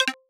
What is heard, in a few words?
Video Stop; android 4.4.4; effects